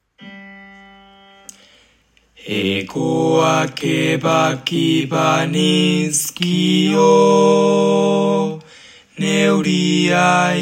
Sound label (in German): zweistimmig